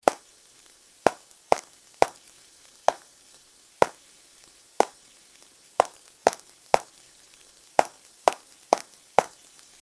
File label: a roblox animated walking